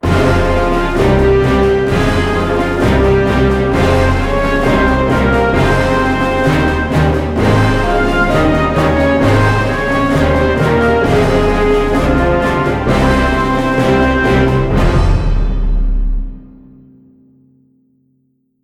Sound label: Cossacks Anthem; Tannenberg